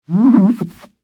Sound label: Car Windshield; Vehicles; Car Sound Effects